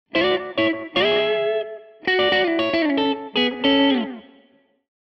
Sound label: chicken picking